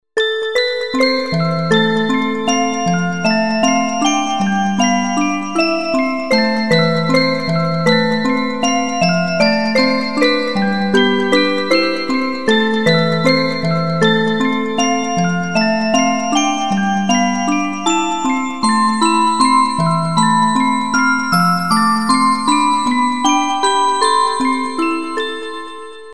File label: Recollection Orgel